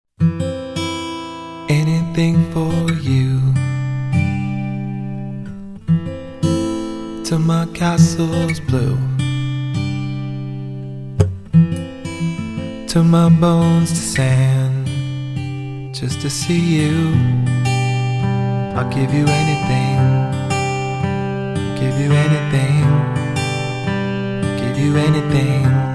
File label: anything acoustic